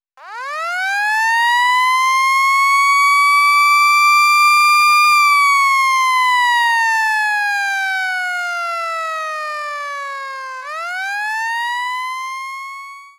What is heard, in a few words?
Q siren